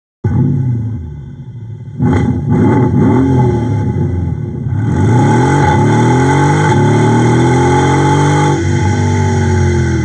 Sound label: Mercedes-Benz AMG S63, Active Sound System; Thor